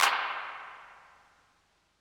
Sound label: Clap